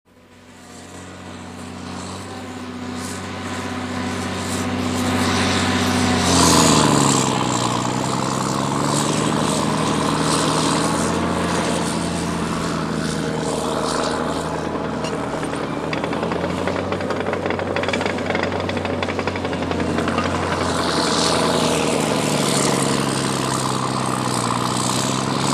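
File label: car passing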